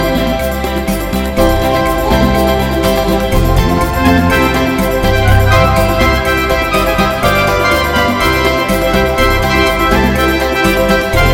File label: Spooky but Hot